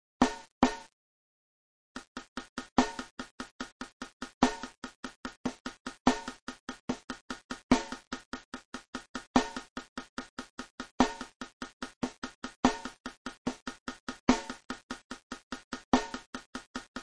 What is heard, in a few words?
funk caixa